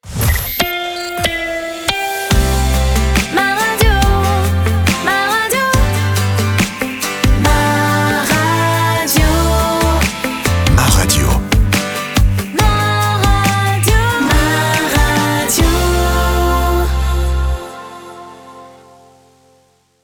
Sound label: jingle; MA RADIO AC VOL.2